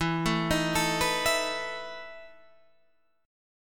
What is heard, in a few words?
E Major 7th Suspended 4th E, Guitar, Standard; arpeggiated; JGuitar